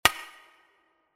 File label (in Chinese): 音效 click; SFX